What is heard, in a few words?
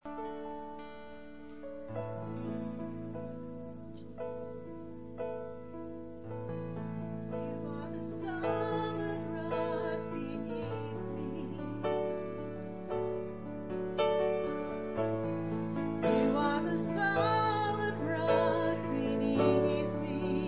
Proph Song